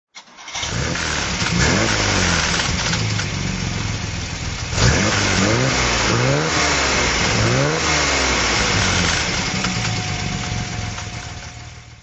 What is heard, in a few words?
flaminia gtl3c throt, Lancia Flaminia GTL 3C; 1965